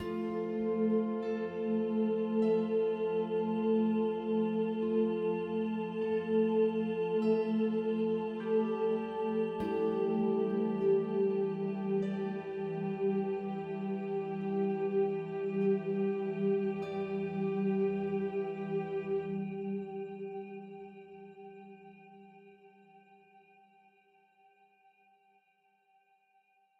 Plaza Stairs Loop; solo islands of the heart switch 2019

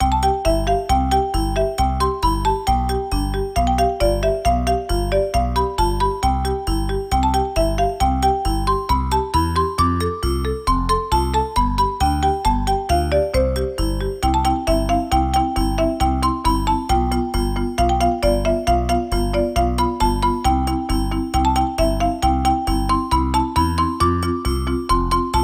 BGM Mini Game; tokimeki memorial girls side 4th heart

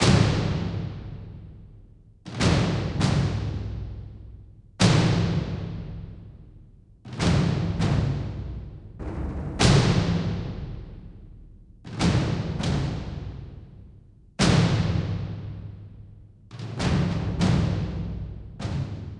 Battle Drums

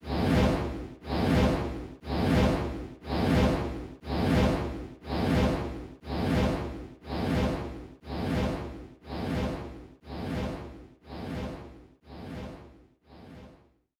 Throw Monster Swing; nari kids park ultraman r b switch gamerip 2018

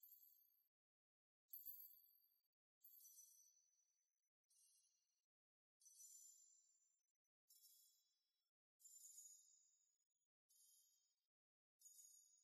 Bells

